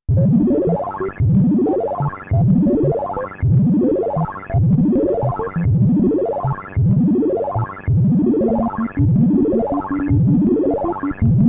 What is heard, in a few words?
SFX Kill; Donkey Kong; arcade